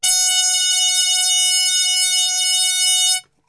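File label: F#2, Brass, trumpet harmon mute; Best Service; Brass Super Section; TRP HARMUTE; 90 s Sample CDs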